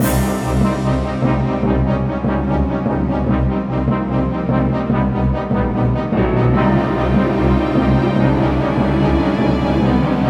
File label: Generic Scary; Valthirian Arc Hero School Story